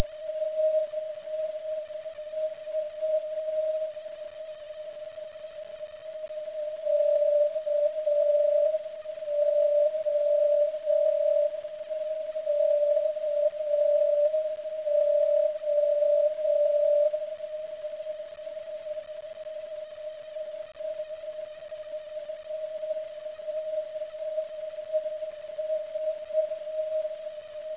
vox

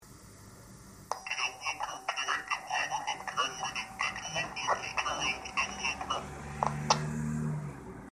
scramble; tyt th-9800

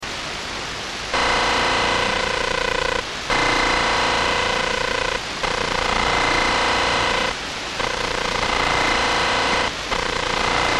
noise

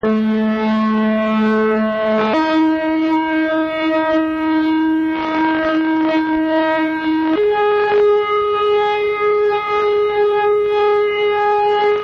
4040 AM signal

